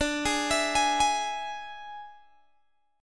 D Suspended 2nd Flat 5th D, Guitar, Open G

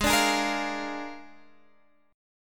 A sharp 7th G sharp

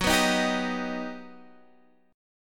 G Augmented Major 7th

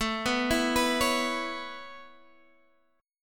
C sharp Minor 7th A; C#m7

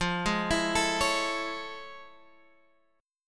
A Major 7th F; AM7/F; arpeggiated

